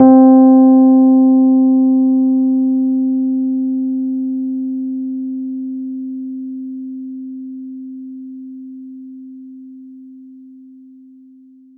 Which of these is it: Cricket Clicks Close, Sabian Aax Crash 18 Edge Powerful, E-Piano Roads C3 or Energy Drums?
E-Piano Roads C3